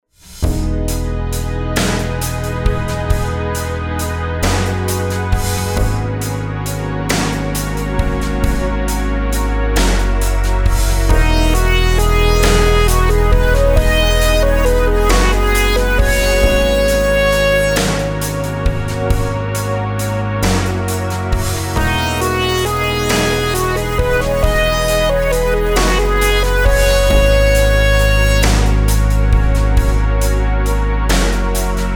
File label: rhythms compound 8s w16s